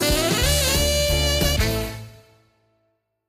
large Win; netent; jackhammer

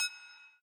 count Up End; piggyriches

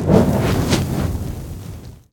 fire Burst; netent; narcos

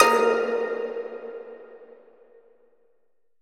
lucky Reel Spin Button; netent; jewelfruits

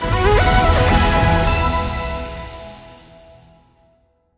medium Win; netent; starburst

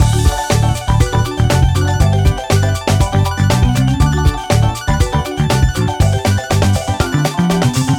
big Win; netent; fruitshop

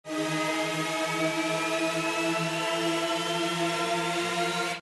respin snd; netent; jackhammer

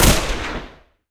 Thug HGun; netent; narcos

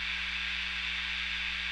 reel spin; netent

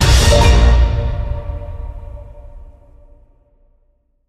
big win loop end; fortunerangers

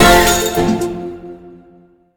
scatter win; netent; copycats

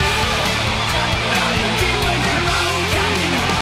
big win loop

